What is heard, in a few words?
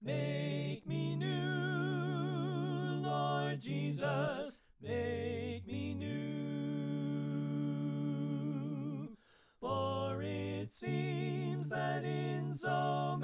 Alto Left